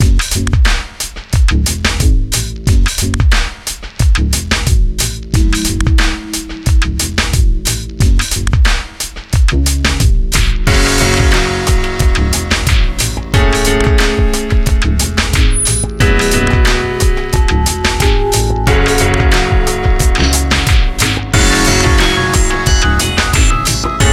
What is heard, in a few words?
dark electronic